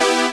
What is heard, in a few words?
Synths E; Vengeance Samples